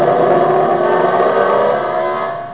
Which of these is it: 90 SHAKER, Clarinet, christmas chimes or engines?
engines